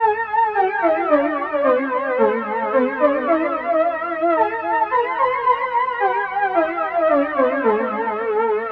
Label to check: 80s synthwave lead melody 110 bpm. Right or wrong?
Right